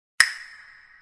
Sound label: clamping single